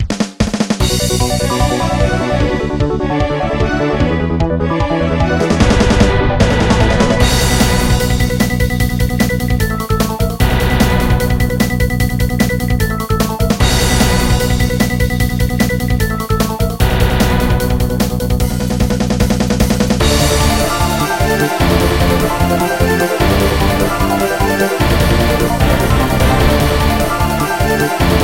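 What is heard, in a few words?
Suspense Crises Theme, MIDI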